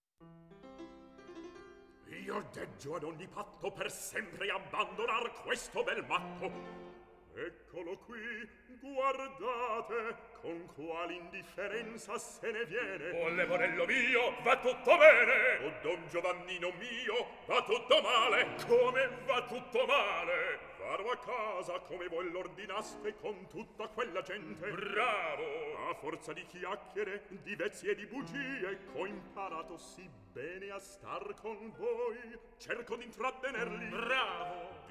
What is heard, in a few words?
Recitativo; Mozart Lirica